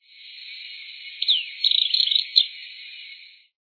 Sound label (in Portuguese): cabeca de ouro